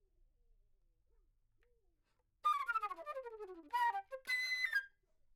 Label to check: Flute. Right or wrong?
Right